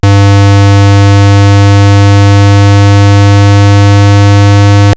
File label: sqr wave 100hz test